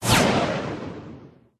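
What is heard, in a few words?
manticore block; mantikora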